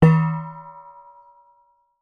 industrial metal post driver impact light resonate; zapsplat